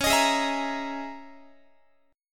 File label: C sharp Minor 6th Add 9th C sharp, Guitar; C#m6add9 strummed